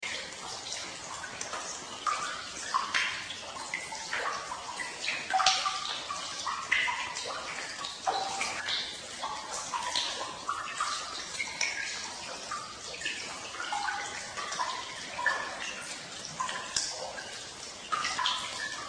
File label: underworld pool drips with heavy echo